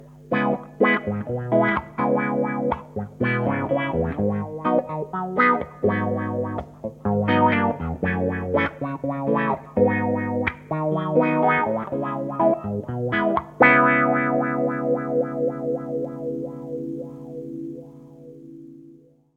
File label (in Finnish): strato talla sweep